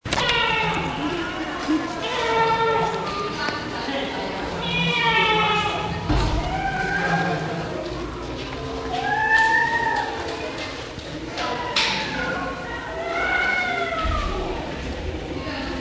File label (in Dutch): entreehal zaal oud